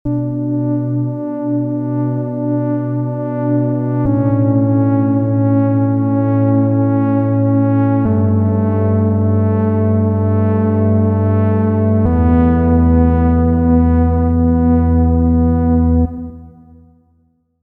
modulate Crescenda; Basic Wavez

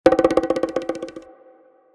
bongo one shot low wet Cm

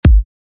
Kick Organic

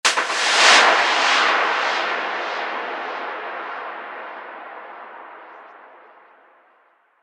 Sweep Down 4 bar 122

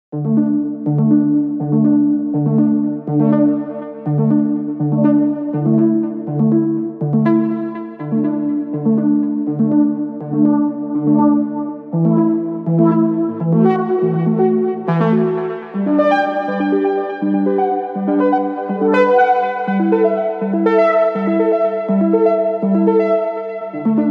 Serum Lead

